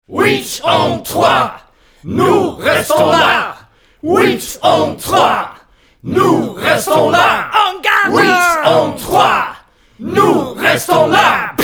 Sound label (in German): Schrei